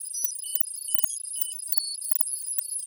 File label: Sound Cockpit Operating